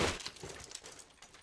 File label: Cloth